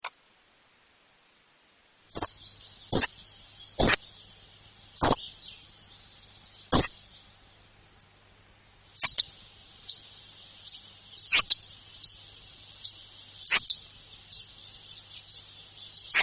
gobemouche gris, chants

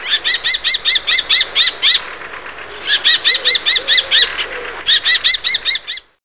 faucon crecerelle, chant

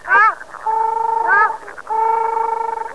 tadorne casarca Tadorna ferruginea, chants

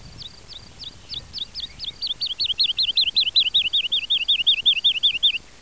alouette lulu, chant

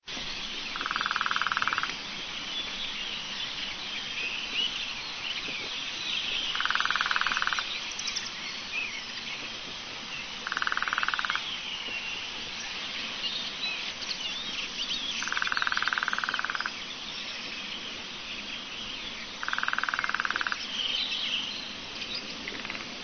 Pic epeichette, chant

